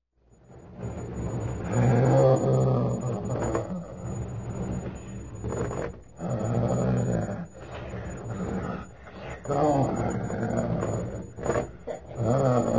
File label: Evil Laughter; horror; horrorgifs